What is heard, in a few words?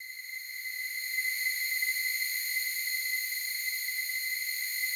slow strings C6, Yamaha VP-1; 90 s Sample CDs; Music Store